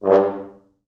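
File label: French Horns staccato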